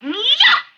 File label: vox attack mmm yoh